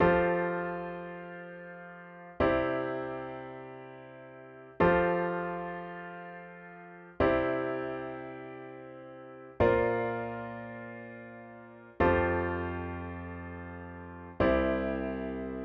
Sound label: pop piano ballad 9th bass notes